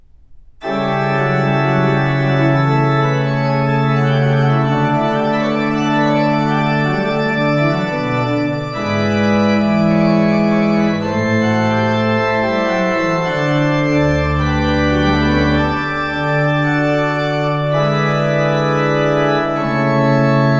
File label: Pachelbel D major toccata excerpt